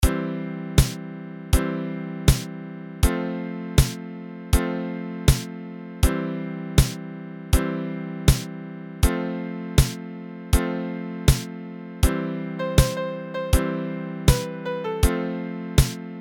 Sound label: music generated; MusicRx-C